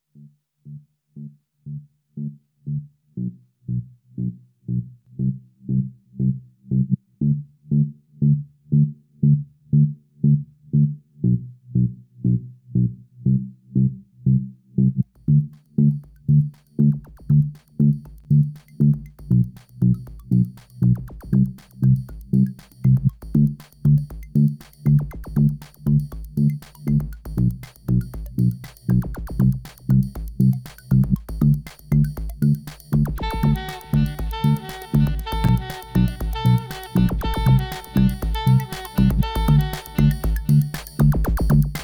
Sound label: Breaking Jazz